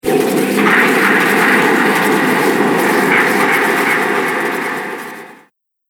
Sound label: Impact Radiation, impact / explosion sound effect; Sci Fi Sound Effects